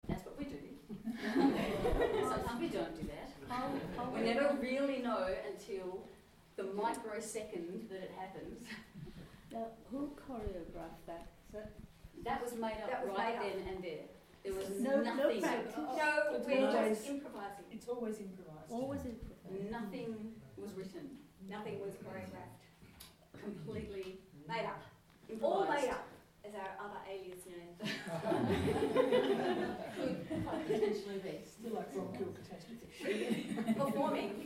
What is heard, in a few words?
Deep South Blues; Saturday Club at John Hargraves